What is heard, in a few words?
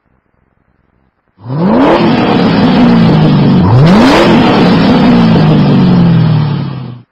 Roaring Mustang